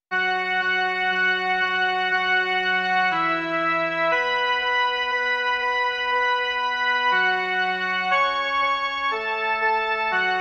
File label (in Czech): jen melodie; Bůh náš všemohoucí